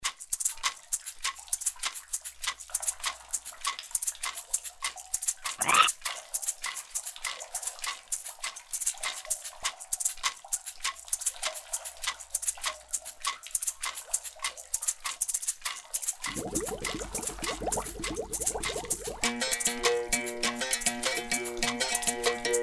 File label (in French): SANS VOIX